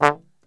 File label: D 1, Brass Super Section, TRMB SHOT; Best Service